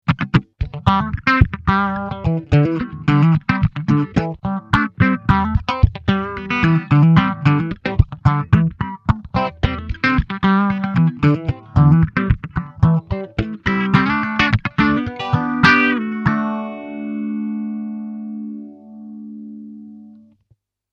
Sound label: Phase 90 Slow